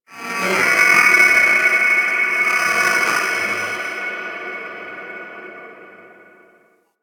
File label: Cephalon Tech Medium, Ambience